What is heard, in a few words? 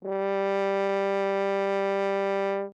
Horn ff G3, Brass; MIS Pitches - 2014